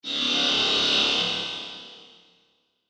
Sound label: Energy Field Burst, Science Fiction; Blast Wave